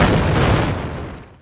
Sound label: space platform explosion; Factorio